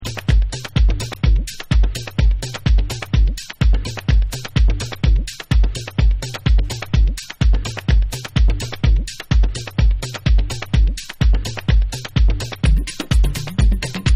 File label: Beats